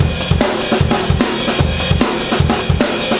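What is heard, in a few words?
150 bpm, beat loops; Zero-G; Monster Beats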